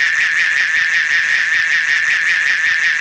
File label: ice force rotate